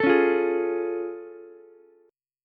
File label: A Augmented Major 7th A, Ukulele; strummed; JGuitar; 14,13,13,11